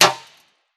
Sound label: bullet hit shield